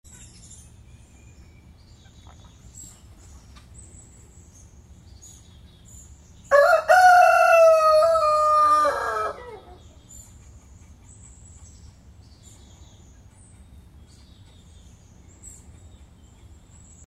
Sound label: Rooster Sound